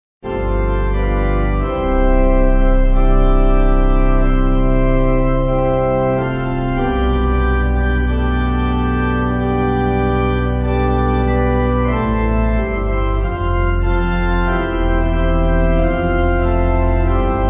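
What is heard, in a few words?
Pipe Organ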